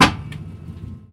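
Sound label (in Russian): металлический удар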